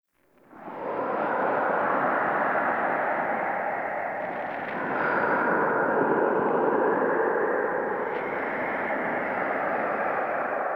Whispers Dark; Warframe; Ambience; Entrati

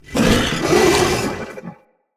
Corrupted Battle Dog Attack Bite Double; Animals; Vocals

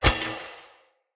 Throw Catch, Swords; Weapons; Player; Mockery Weapon Common

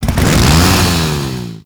Saw Accent Rev; melee; weapons; Warframe; Grn Ghoul Saw Player; Kuva Ghoul Saw

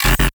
Ability Cooldown Error; Warframe; Veilbreaker; Kahl Abilities

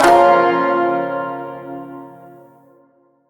Shawzin Hirajoshi Chord E; Warframe

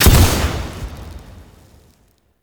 Sentient Destroy; Warframe; Sacrifice; Sacrifice Shrine Intro; Cinematics

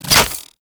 Bow Unequip; Warframe; Corpus

